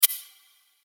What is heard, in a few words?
Verby Shaker